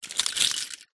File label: leisure toy metal cars pile push around carpet; zapsplat